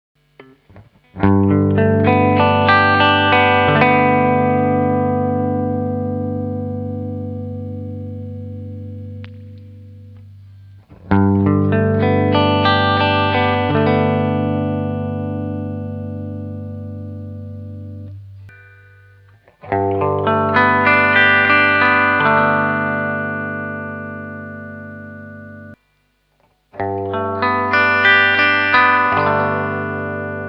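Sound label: Humbucker Phase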